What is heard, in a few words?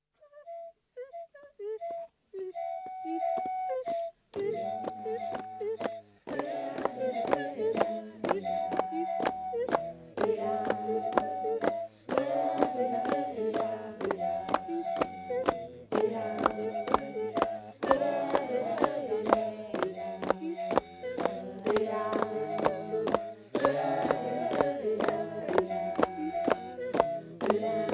Mo-beke Pipes And Singing Aka